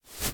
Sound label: Footstep Beanbag